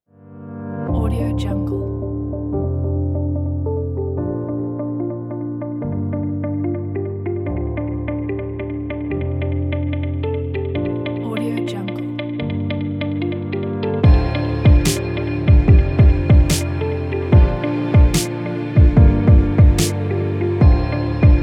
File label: soft technology corporate